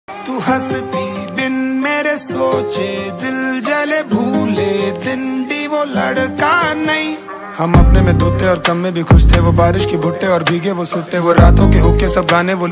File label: hindi rap ringtone